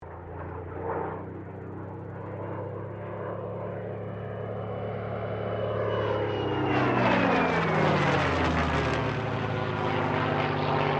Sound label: AIRCRAFT SUPERMARINE SPITFIRE MK-XVI LOOP NOISE; Airplanes; Vehicles; Airplane Sound Effects